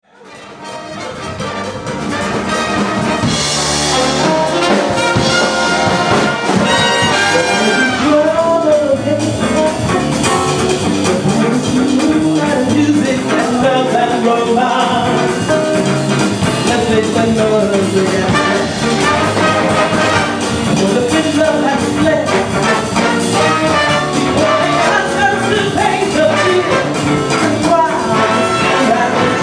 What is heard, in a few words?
Big Band